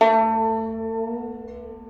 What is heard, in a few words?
upward portamento